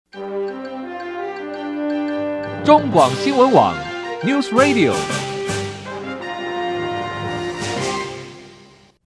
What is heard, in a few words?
NewRadio Jingle